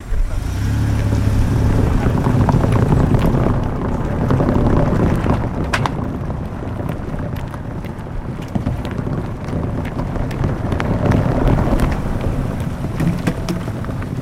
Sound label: Car Tires On Cobblest